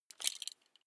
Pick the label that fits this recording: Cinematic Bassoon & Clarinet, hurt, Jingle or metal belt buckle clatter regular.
metal belt buckle clatter regular